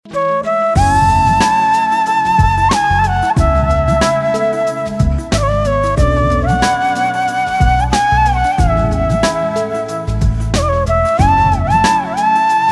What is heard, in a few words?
Breathless Flute